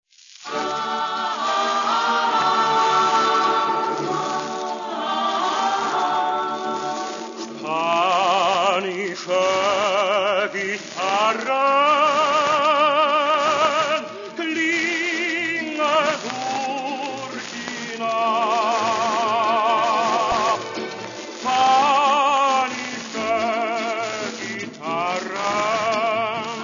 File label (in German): Spanische Gitarren